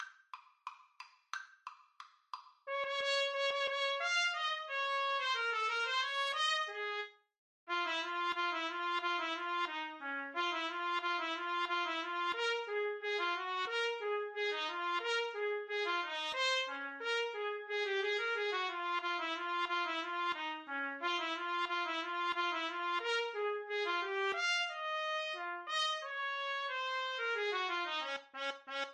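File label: radetsky march trumpets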